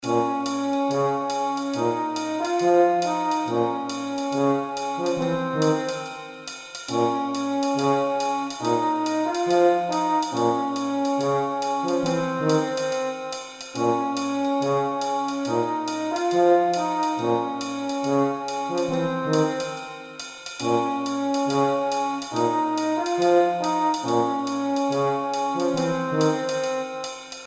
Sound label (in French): orchestre trombone tuba